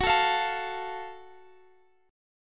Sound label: F sharp Minor Major 7th, guitar; chordshape; down